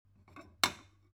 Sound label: object kitchen stone bowl shelve short